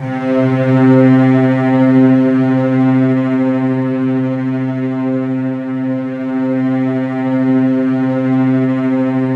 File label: C2, Roland JV 2080 TECHNO PAD